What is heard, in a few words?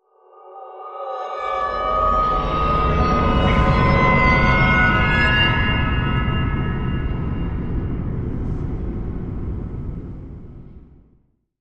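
Whoosh Suspicious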